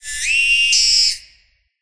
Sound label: Mactera Grabber Scream